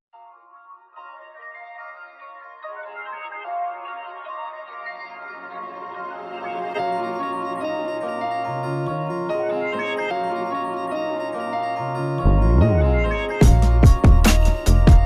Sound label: Instrumental